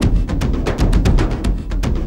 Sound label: Vam, Frame Kendang; Sonic Foundry (Sony Creative Software) - Friendly Fire; Drums